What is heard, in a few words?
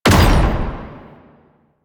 Perfect Heavy Attack Impact; Warframe; Weapons; Melee